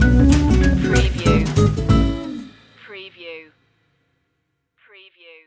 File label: deck the halls jazz stinger